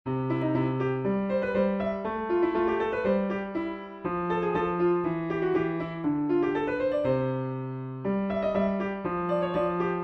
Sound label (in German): Klavier solo; Morgten kommt der Weihnachtsmann